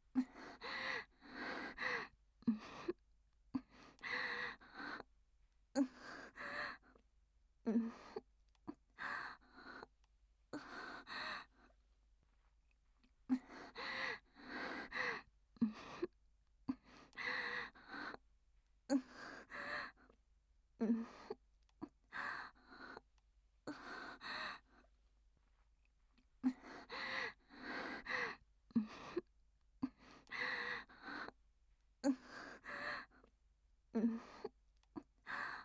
喘ぎ声

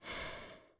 ブレス ロング

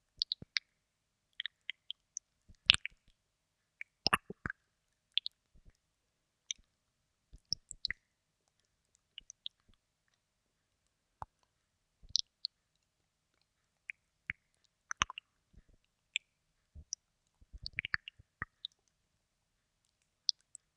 ローション音

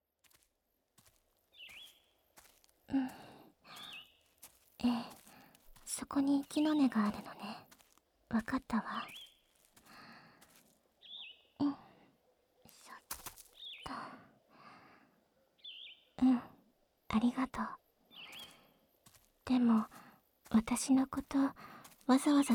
ベロチュー; 『ベロチューするの、好きよ』

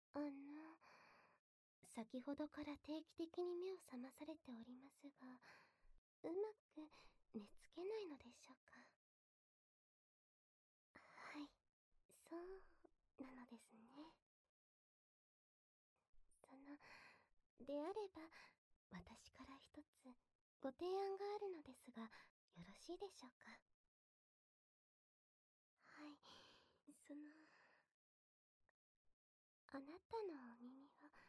寝かしつけ耳舐め

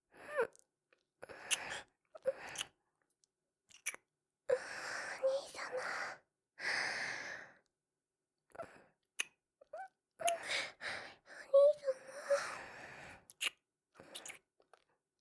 キス; リリア

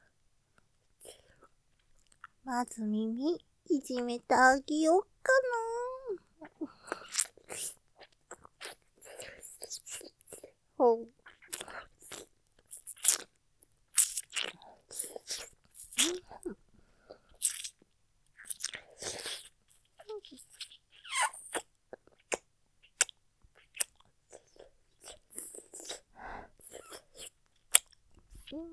舐め 耳舐め, ASMR; 耳をいじめてあげようね; モノラル; ループで楽しむ！シェフの気まぐれ抜き抜きコース

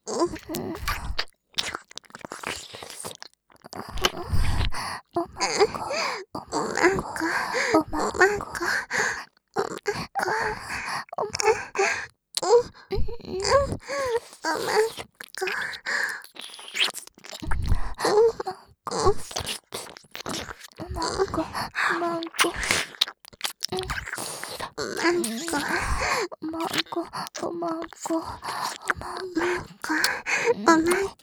耳舐め 喘ぎ; お屋敷メイドのハーレム淫語ヘヴン; バイノーラルオナサポ音声

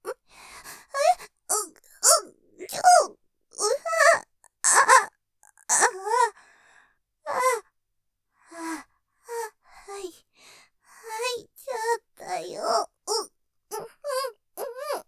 喘ぎ 入っちゃったよぉ じっくり味わうように挿入感, ASMR; ステレオ; ループで楽しむ！シェフの気まぐれ抜き抜きコース

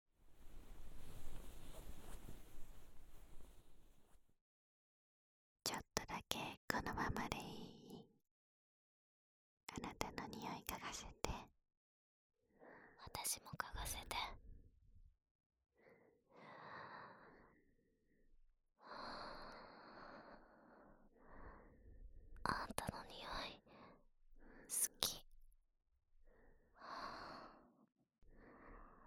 全力の耳舐め; コンビネーションによる全力の耳舐め

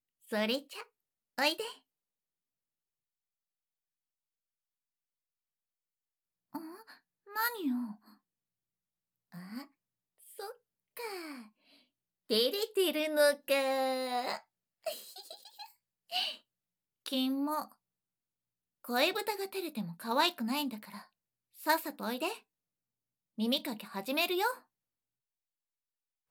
まずは右耳を耳かき

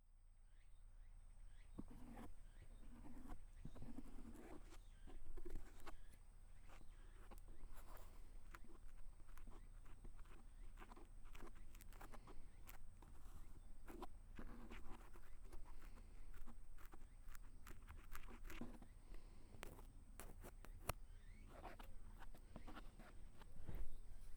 左耳かき; 守り神とふたりぐらし。 ～ささやき、耳かき、添い寝と癒しのひととき～

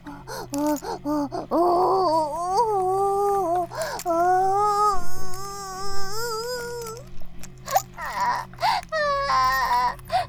バイブ放置, ASMR

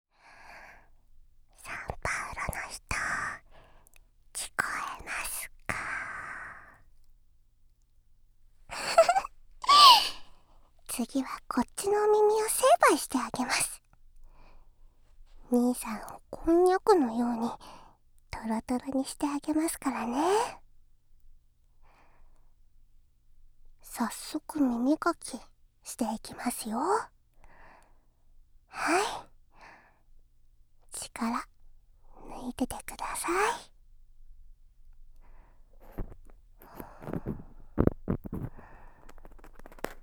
耳かき（右耳）

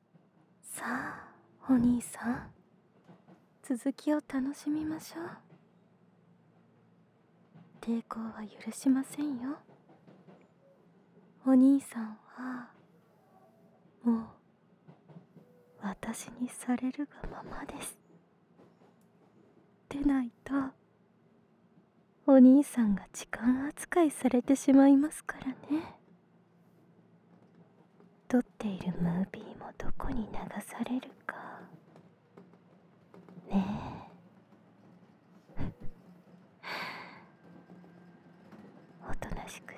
艶吐息耳舐め; 右耳; 艶吐息耳舐め誘惑

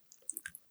くちゅ音, ASMR

